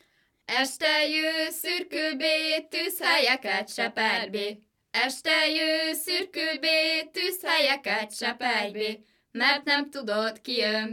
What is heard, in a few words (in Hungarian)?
nepdal